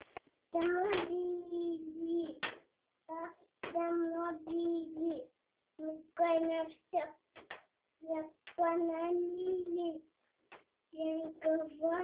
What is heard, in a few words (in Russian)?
пение